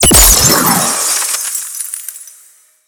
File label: Cryo Blast